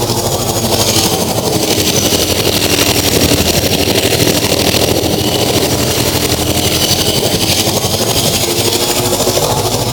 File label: Movement Engine Loop, Vehicles; Warframe; Archwing